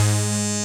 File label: SYN G, BUZZ SYNER